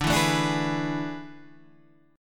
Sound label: A Major 7th Suspended 2nd Suspended 4th